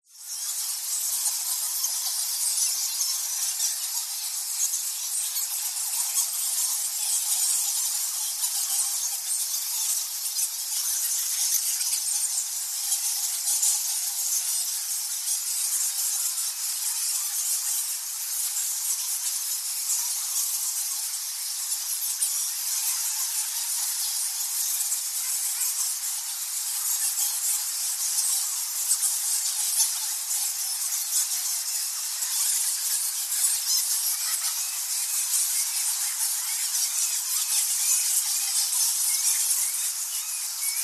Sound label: bats thousands indonesia bali cave temple; goa lawah